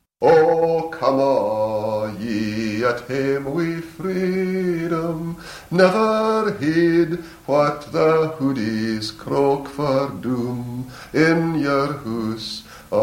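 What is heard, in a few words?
scot voice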